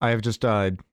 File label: death